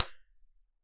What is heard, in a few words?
Clasroom; MIT environmental impulse responses